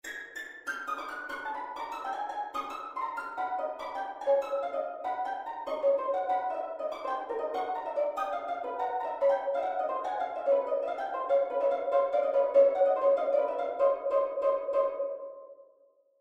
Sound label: Violin; Mass